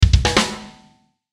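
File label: Musical Element, Drum Snare March; Blastwave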